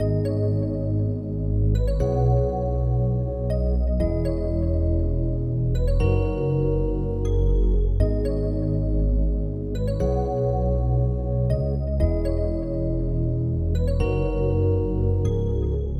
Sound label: Loop